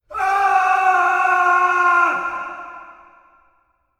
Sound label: man scream; human